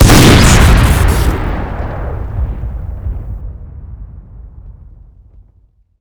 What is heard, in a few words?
rifle shoot crit, weapons; tf2classic